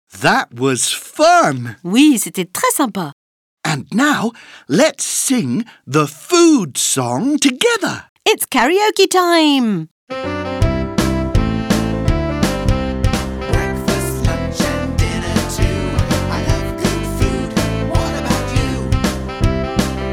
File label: song karaoke